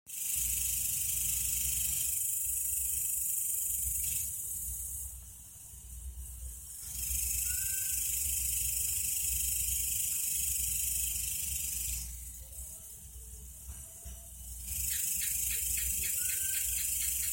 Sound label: Night noise